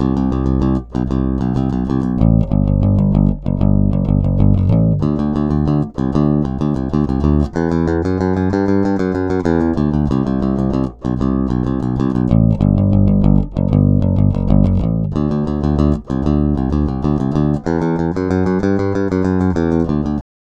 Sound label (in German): plektrum